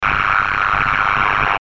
Data Element Chopper Radio Transmission; High Tech; Blastwave

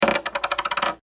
Ring Drop Foley Spin; Drops; Objects; BBFX

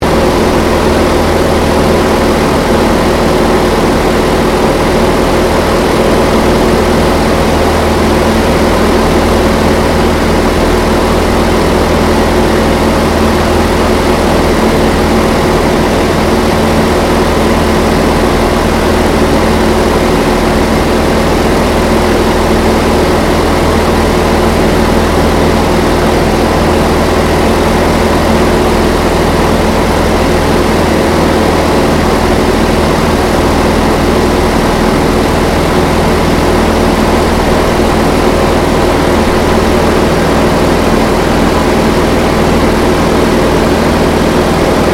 AIRCRAFT T-28D TROJAN FLYING INTERIOR; Airplane; Vehicles

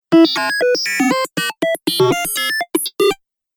Digital Data Sequence, Sci Fi Music

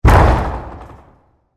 Hockey Check, Body, Check, Into, Boards; Sports

